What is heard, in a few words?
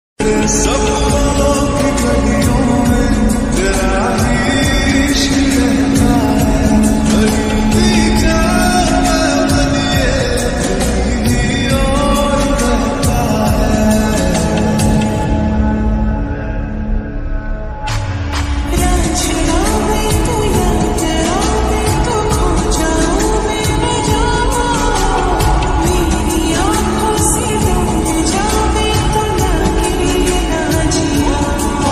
lofi x slowed song ringtone